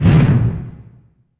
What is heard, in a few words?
IMP FLAME, Cinematic Impact